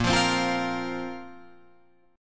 Bb Suspended 2nd Bb down Guitar Open F, Bbsus2 chord; strummed